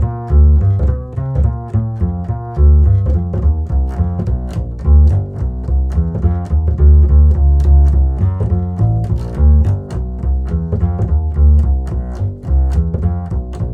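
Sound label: walk A#, 212 brisk walk, bass; Spectrasonics; Bass Legends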